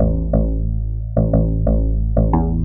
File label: bass